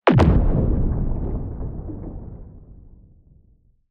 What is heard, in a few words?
Large Underwater Explosion